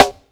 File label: SNR, Snares